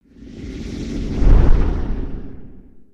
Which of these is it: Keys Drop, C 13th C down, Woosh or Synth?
Woosh